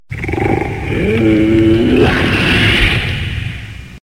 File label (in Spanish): Rugido Puma; Soy de Pumas